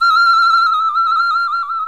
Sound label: BIRD, bird call; Greytsounds VOL-1; BIRD CALL FX